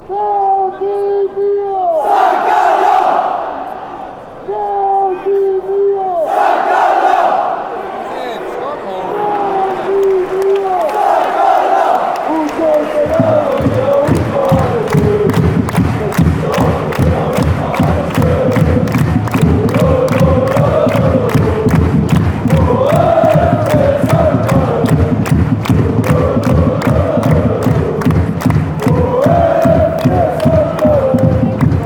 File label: fan chants; lo lo lo saint gallen